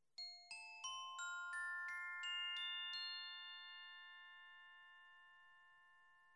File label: Glock